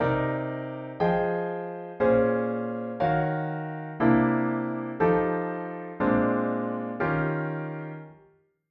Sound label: Jazz Chords Minor 9; Jazz Chords Made Easy